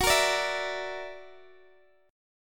Gb Augmented 7th, down, Guitar, Open D

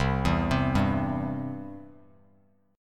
C Major, Bass Standard; arpeggiated; JGuitar

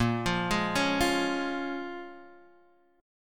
A sharp Minor 7th, Guitar, Drop D

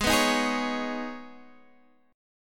B 6th Add 9th G sharp, guitar chord

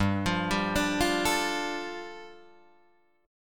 G 7th Suspended 2nd, Guitar DADGAD

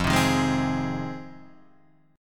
F Minor 7th F Guitar Standard; strummed; JGuitar

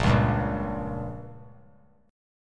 A sharp Minor 6th; strummed; 10,8,10,8; 3C,3F,3A,3D; JGuitar

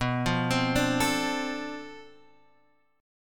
D-Minor 7th B, chord; Dm7/B; arpeggiated

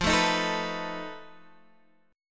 F 7th Flat 5th, Guitar Standard; strummed; chord; F7b5; 13,12,13,14,12,x; JGuitar

